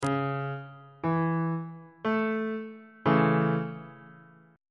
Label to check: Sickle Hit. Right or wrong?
Wrong